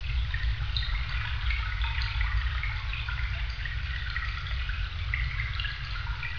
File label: water trkl